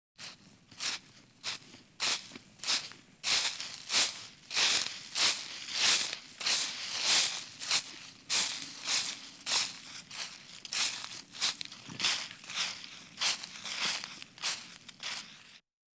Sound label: one person walking, Grass and Dirt